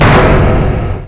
tentacle strike

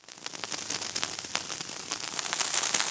cannon fuse; weapons

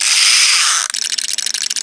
grappling hook reel start; weapons

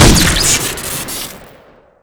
zenitka shoot crit; weapons

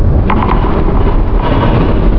talk move, plats

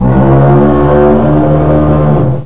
garg alert

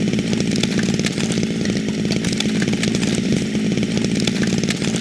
chainsaw idle; weapons

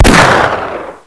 357 shot, weapons

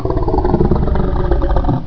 disgusting, ambience

